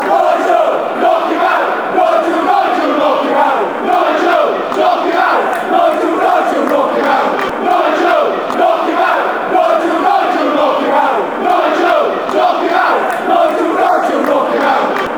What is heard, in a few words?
knock him out, fanchants